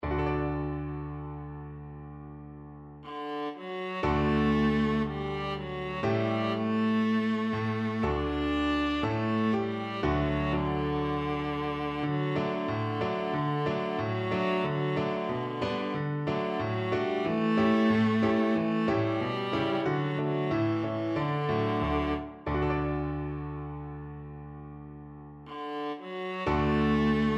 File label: children go, viola